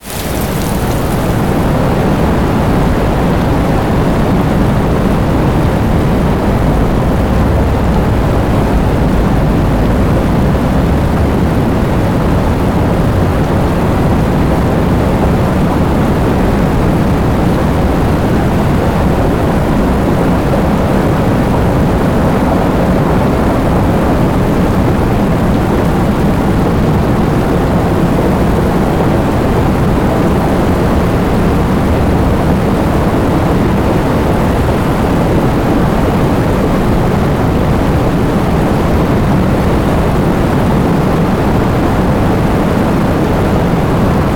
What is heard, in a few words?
heavy rain; daniel simon